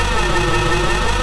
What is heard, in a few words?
Game, 游戏音效; 特酷声音素材库